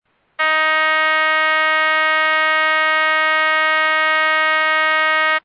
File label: horn